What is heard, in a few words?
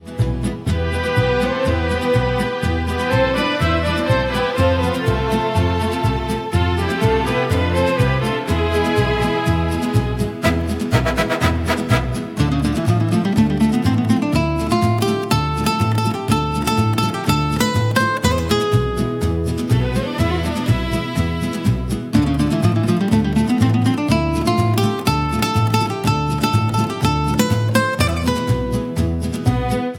lone rider, wild west music; wild west music pack